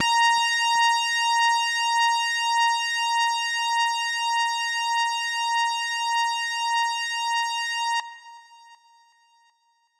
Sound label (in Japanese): 音程 男性 A4